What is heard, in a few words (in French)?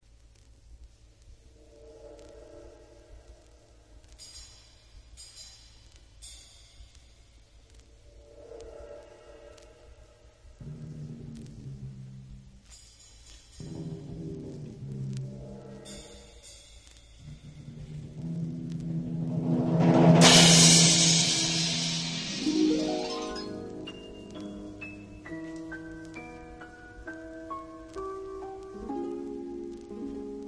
Le rapprochement timbales-cymbales Percussions; Pierre Gabaye